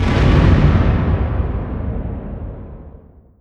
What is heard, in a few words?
distance ships destroyer attack; Hearts of Iron IV Death or Dishonor